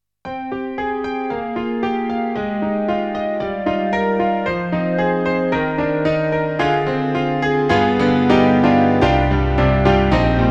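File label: Korg N1R Electric Grand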